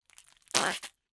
cartoon squelch splat

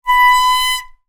transport bicycle brake squeak loud; zapsplat

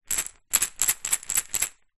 household medicine pills in glass jar shake

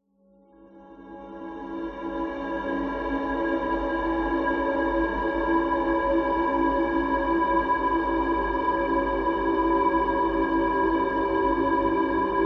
horror drone atmos eerie weird mid range whine; zapsplat

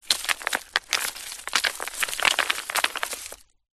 rocks small pile movements; foley

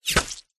bullet hit body; zapsplat

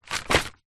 mail bills paper small pile drop carpet; foley; zapsplat

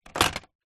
toy plastic large figure set down on wooden floor

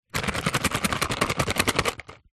crayons in card pack shake; office

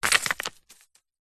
foley stone drop ground light debris

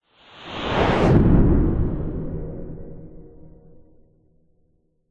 science fiction craft race past zoom fast; zapsplat

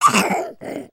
human cough boy 2 years painful sore throat; sound effects one

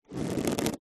rubber squeak; foley; zapsplat

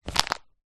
rolled up news paper tighten grip scrunch; foley

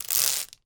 office tape parcel rip; sound effects one

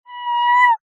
animals cat kitten meow; zapsplat

